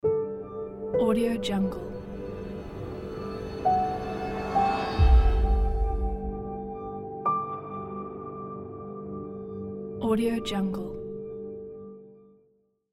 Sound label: chill piano ident; scoringaudiogeeks